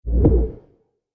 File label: Trailer Hit Future Filter